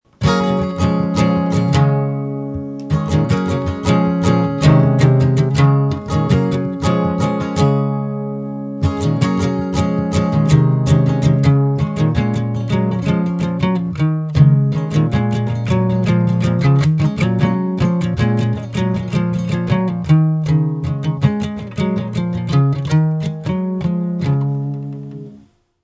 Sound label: Acoustic Riff, Nice acoustic guitar riff; Guitar Sound Effects; Musical